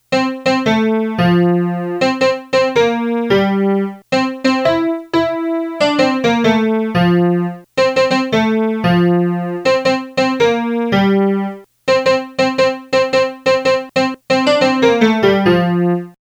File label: melody; southern songs